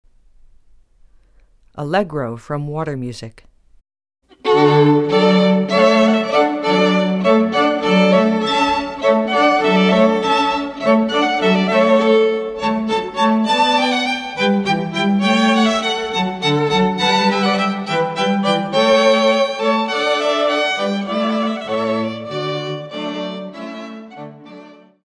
allegro, string quartet

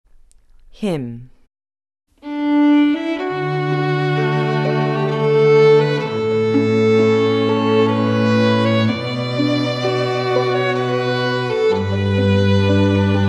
hymne, string quartet

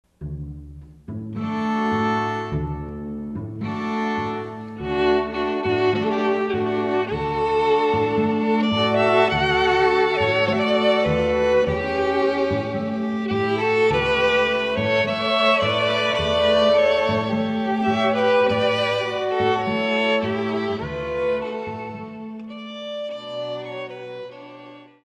spanish, string quartet

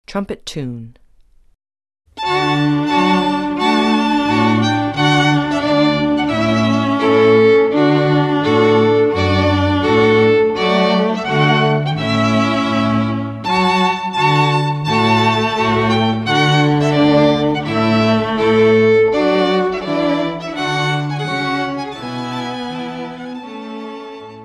trumpet tune

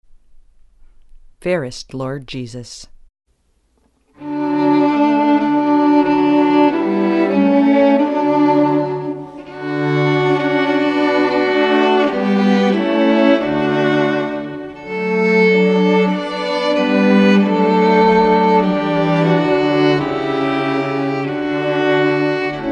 Fairest Lord, string quartet

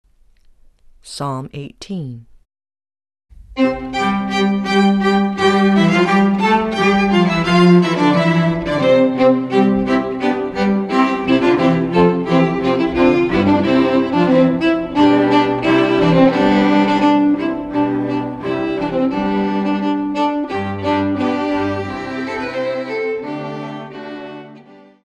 psalm, string quartet

